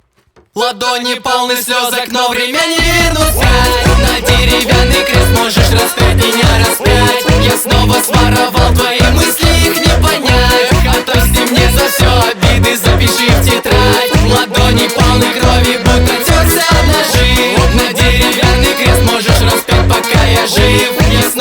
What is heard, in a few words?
Folk Remix